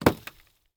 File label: Weather Snowball Hitting Car; AdobeCC